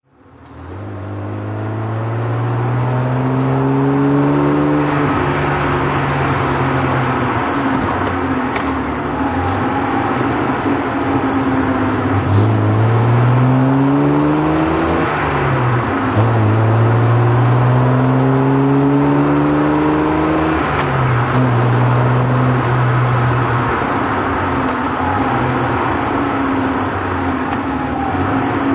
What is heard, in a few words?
healey 3000 mk1 drive